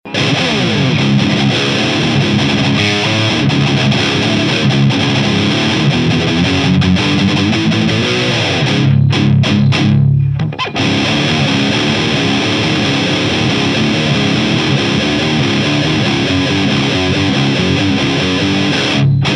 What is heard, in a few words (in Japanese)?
Marshall JCM2000 ハイゲインサウンド エフェクターでブーストする; JCM2000純正